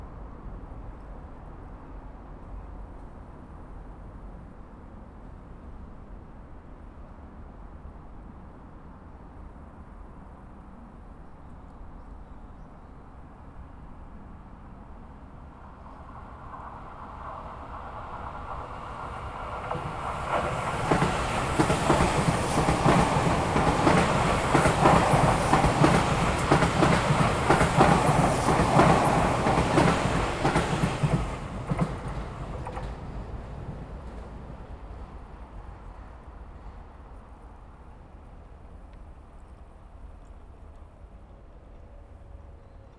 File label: Stereo, walk; ZOOM F6 MultiTrack Field Recorder